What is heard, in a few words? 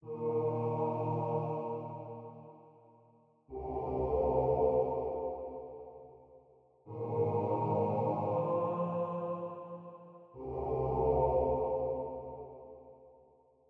Vox; raji an ancient epic